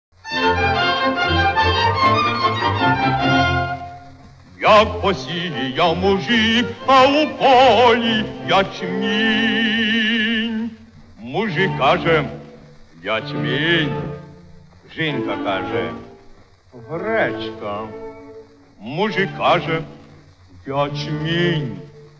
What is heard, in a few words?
ukrainian traditional song